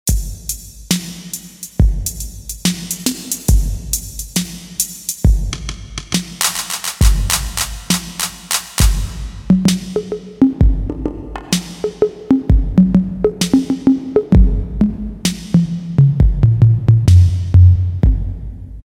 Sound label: Drum Set, Casio CTK-601 Tones